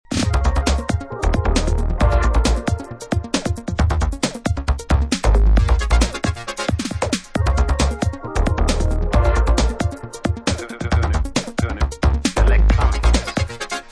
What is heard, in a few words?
8 puls beat